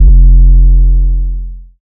808 dual F, Tonal; Hip-Hop; Slate; CAICOS Hip-Hop Sample Pack

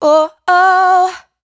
"Oh Oh", adlib, Dry Vocals; Raw Vocals; Slate Digital Omnivox Vocal Sample Pack; Adlibs

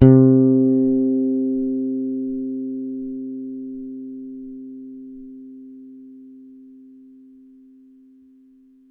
Bass oneshot C, Electric Bass One Shots; Slate Digital BASSHOLE Sample Pack

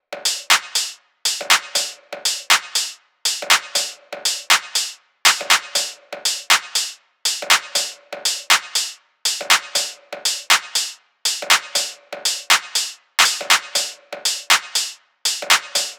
tops 120, Drum Sample Pack, Top Loops; SD SEISMIC; Slate

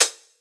hats, Pop; Drums; Slate; SD PASADENA Pop Sample Pack

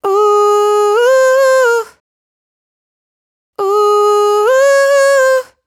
riff Fm, Vocal, Dry Vocals; Raw Vocals; Slate Digital Omnivox Vocal Sample Pack

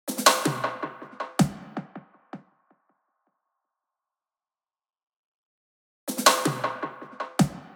perc loop 80, Pop, Drums; Loops; Slate; SD PASADENA Pop Sample Pack

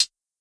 hat low, Closed Hat; Hip-Hop; Drum One Shots; SD CAICOS Hip-Hop Sample Pack; Slate

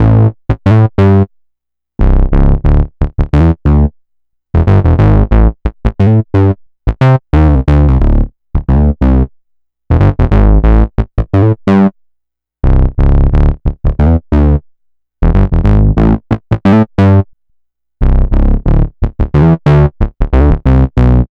Synth loop 90 Am, Synth Bass Loops; Slate Digital BASSHOLE Sample Pack

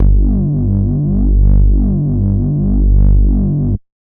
Synth oneshot Long G, Synth Bass; Synth Bass One Shots; Slate Digital BASSHOLE Sample Pack

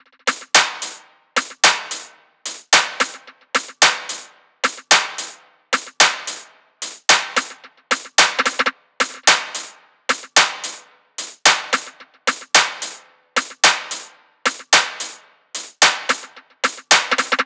tops 110, Drum Sample Pack Loops Top Loops; SD SEISMIC; Slate

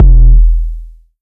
Kick, 909 Kicks; Slate; Vintage Drum Machines Volume 3 909 Edition

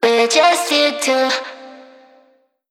phrase Bb, Vocal

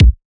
kick one shot, drums; Pop; SD PASADENA Pop Sample Pack; Slate

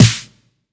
909 Snare; Slate; Vintage Drum Machines Volume 3 909 Edition

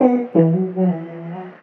vocal softer, Bm; SD AURORA Sample Pack; Slate

